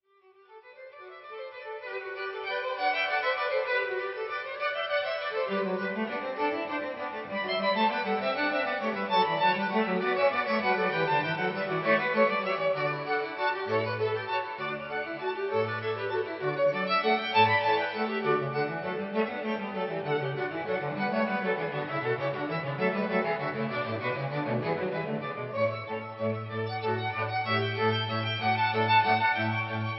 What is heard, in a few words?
quartet; branderburg 3